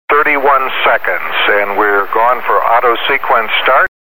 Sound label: NASA SPACE LAUNCH